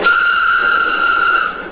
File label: klaxon, Train; EWII BDt+7EWII; OpenBVE